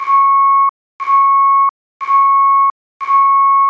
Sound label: Reverse Alarm Truck Clean